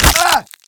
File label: fall pain; Team Fortress 2